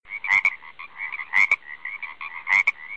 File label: frog croak